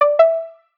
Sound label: engaged; tici; carrot2-v7-wip10